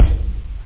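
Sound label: bass drum; kicks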